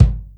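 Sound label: bass drum funk; 300 Drum Machines; Yamaha Motif ES-Series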